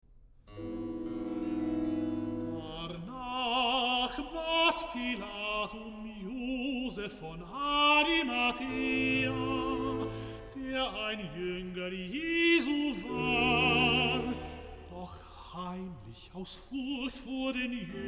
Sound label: Recitative; Bach, J S; Johannes-Passion, BWV 245, Pt. 2; XLVI. Recitative. Darnach bat Pilatum Joseph von